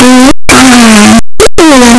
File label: pikachu cry; pokeyellow